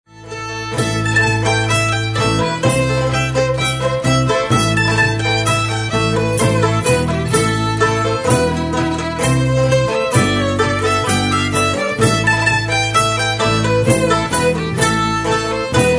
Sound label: Reels; Shetland